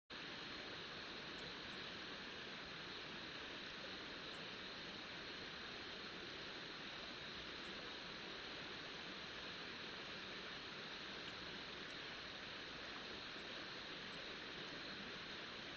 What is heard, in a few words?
riverside; Kyoto U; Ashiu